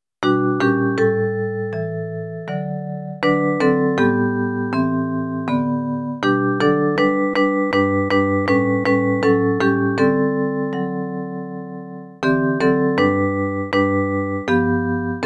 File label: vibraphone; He Is Lord